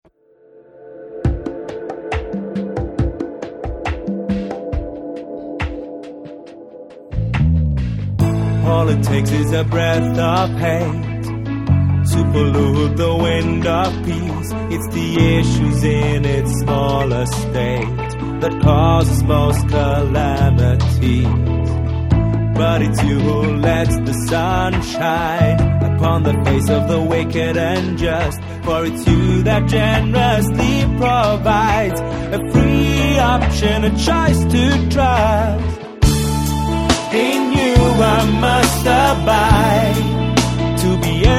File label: your heartbeat